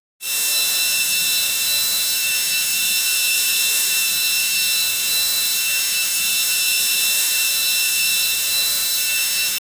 electric snake hiss robot

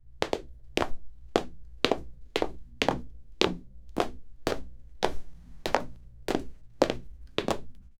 sneakers falling on

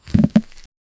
sudden hollow skull roar; teeth snap

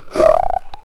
snarling frozen wolf grow; ice

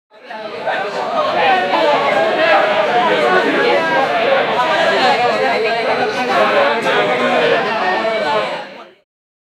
a crowded bar

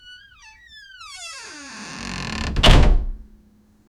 a large creaky wooden door

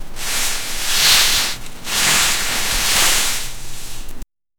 sound of cloaks being spr, clothing

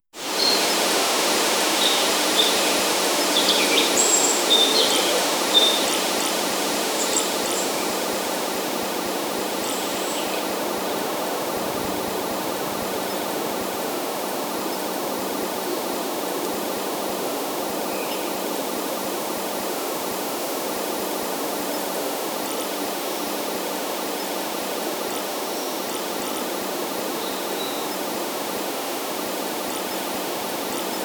deep forest ambient sound, distant animals

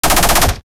ship shooting bullets for

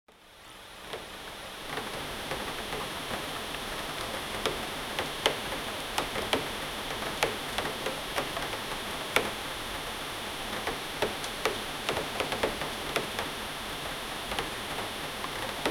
inside a cozy timber bedr, wooden deck

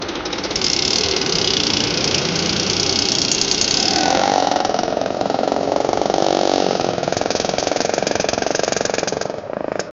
riding a new model scooter

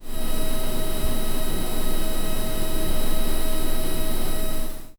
text is printed on the; ui sounds

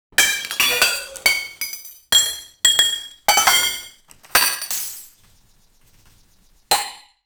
broken plates, plates fall

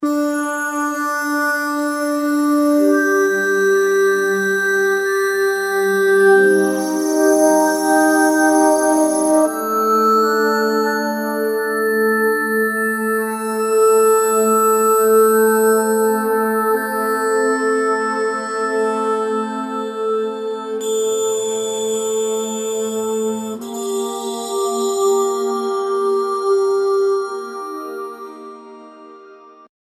japanese ethnic ambient

